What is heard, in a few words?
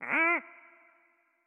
Hurt; Patella